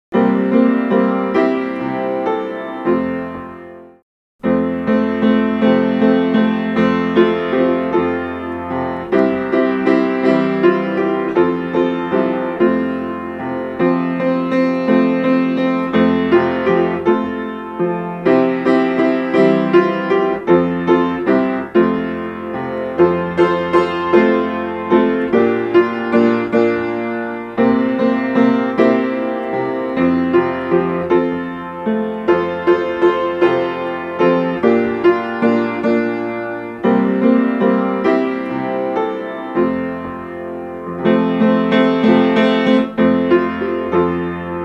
Jesus Loves Even Me, piano; I am so glad that my Father in heaven, tells of his love in the book he has given

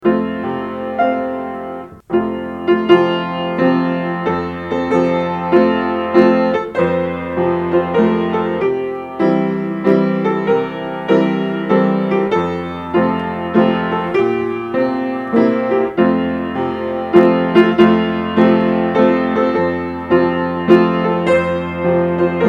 Sunday Monday, piano; Sunday, Monday, Tuesday Wednesday, Thursday, Friday, Saturday

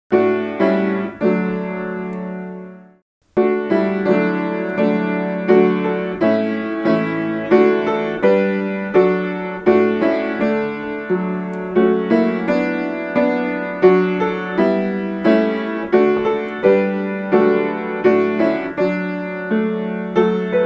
Come Thou Fount, piano; Come Thou Fount of Every Blessing

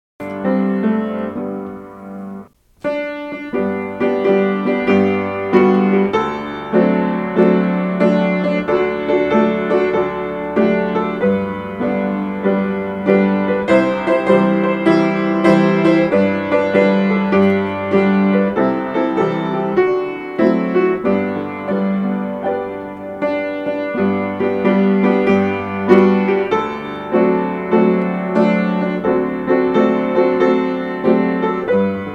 Oh Be Careful, piano; Oh Be Careful little eyes what you see

